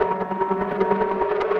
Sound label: Ambi Fex, 150bpm; rhythmic inspiration